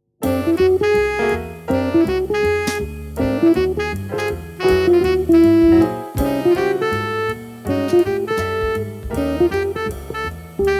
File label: jazz; allvalley